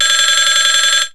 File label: phone